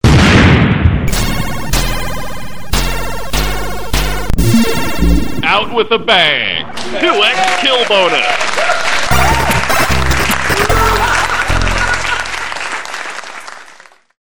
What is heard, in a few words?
out with a bang